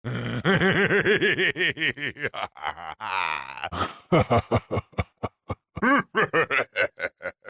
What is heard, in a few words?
zealot laugh